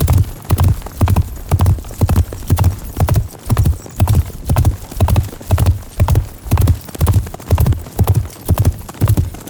Unyielding Gallop Hooves Loop; Warframe; Dagath

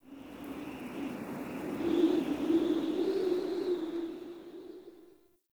Skylight Wind Howl Gust; Ambience; Dungeons; Under City

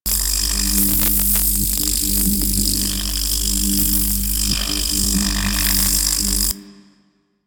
Sentient Laser Beam Loop; Warframe; DE; Sentients; Sentient Trooper

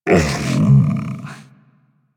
Interact Affection Closed Mouth, Kubrow; Warframe; Tame

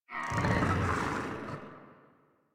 Infested Charger Idle, Enemies; Warframe

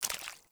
Walk Water, Footsteps; Enemy; Mockeries; Mockery

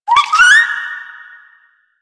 Rabbit Ambient Call; Duviri; Animals

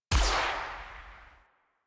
Tranquillizer Rifle Tail; Warframe; Tenno

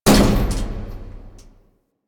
Railjack Weapon Two Hull Mech; Modular Weapons

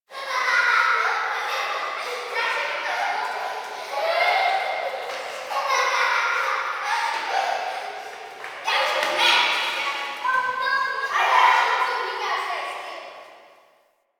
Dungeon Island Misc Children Ambience; Warframe; Duviri

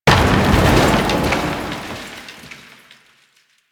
desk destroy; ambience; Warframe; Entrati

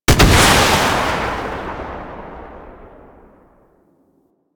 Rocket Launcher Shot; Weapons; DE; Warframe; Tenno; Lasrian

